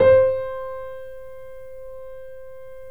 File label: C, The Piano; InVision Interactive